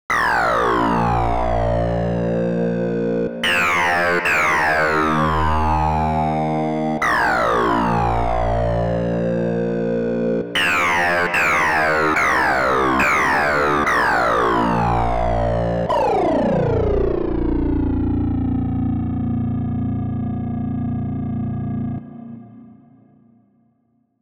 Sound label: saw mod